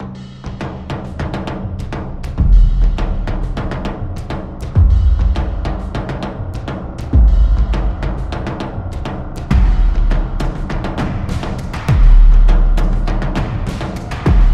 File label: Tribal